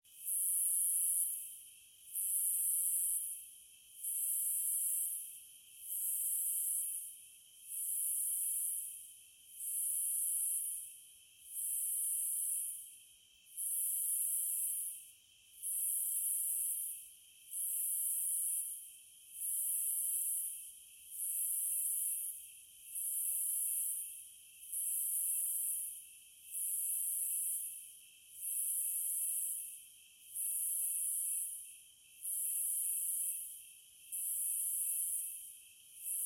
Cicadas

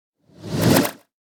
Quick Inhale; DJ sounds

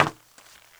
metal, footsteps; 1000fx

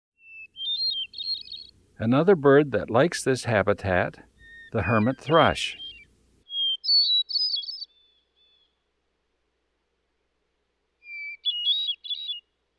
Hermit Thrush; Eastern North America; Dan Gibson; Wildlife Identification By Sound

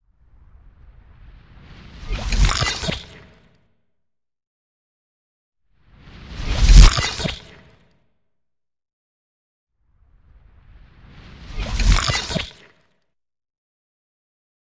Weird Wet Computer Beep x4; Hollywood Edge Sound Designers Tool Kit

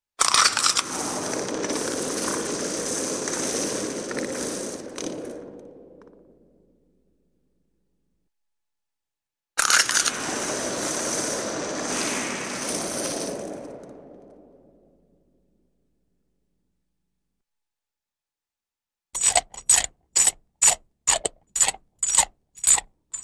Rapid Reverberant Crunches & Chewing; Hollywood Edge Cartoon Trax

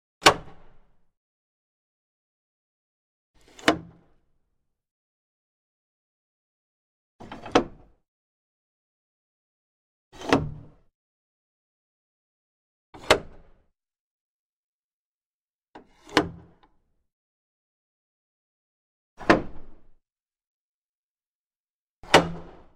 Bolts Various Exterior; Hollywood Edge Historical Series; cd4 Castles Palaces & Monasteries

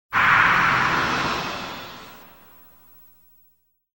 synthesizer; SOUND EFFECTS1

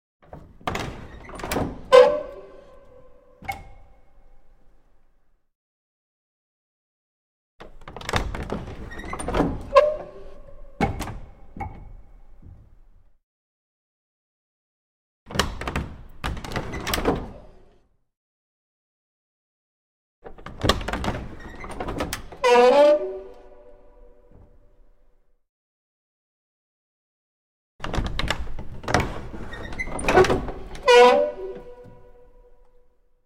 Confessional Box With Groani; Hollywood Edge Historical Series; cd4 Castles Palaces & Monasteries

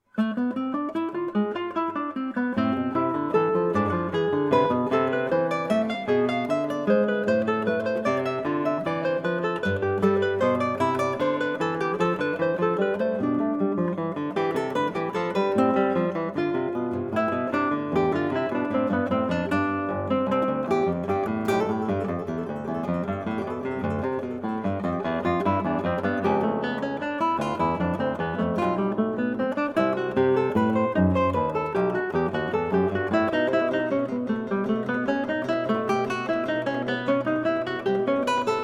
Invention 4, BWV 775, 8 string guitar; JS Bach

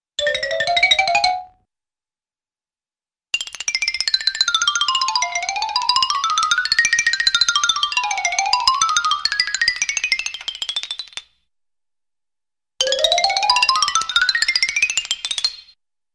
Xylophone Sounds; Hollywood Edge Cartoon Trax

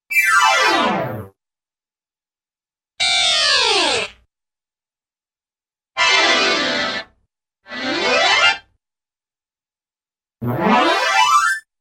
Accordion Descending - Ascending; Hollywood Edge Cartoon Trax

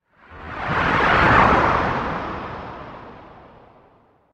Whoosh - Weird flanged air whoosh by; Hollywood Edge Sound Designers Tool Kit

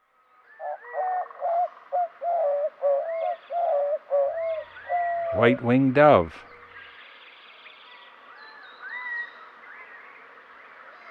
White-Winged Dove; Western North America; Dan Gibson; Wildlife Identification By Sound

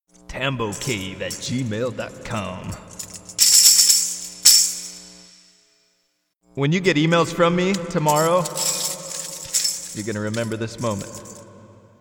Interlude

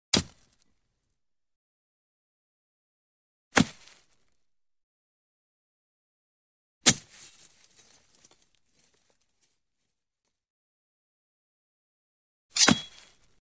Sword Into Ground Sword Stick Into Ground; Hollywood Edge Foley Sound Library

